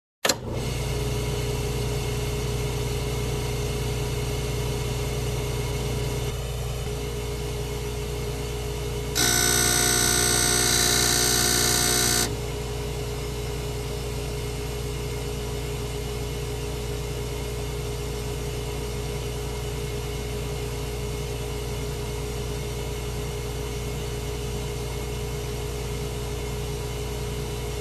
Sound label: Medical Device operating